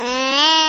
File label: baby hits its self with a phone